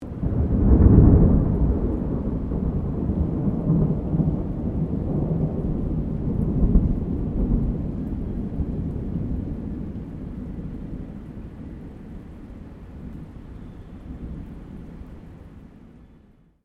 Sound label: THUNDER STEREO; Thunder (Sound Effects:Weather)